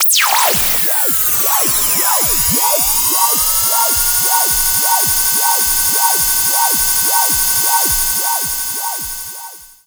Ion Cannon Charge

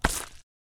Weapon Laser Fire

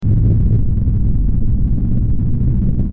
Rocket Engine

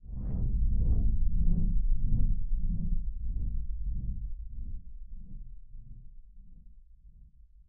mine activate

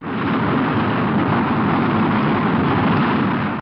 Gravity Bomb Flight

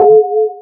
Button aua

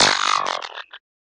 Weapon Failure

